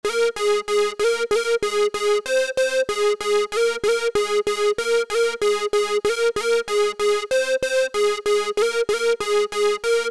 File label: Lead